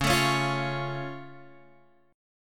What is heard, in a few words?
C sharp Augmented down Guitar Open F, chord; strummed; JGuitar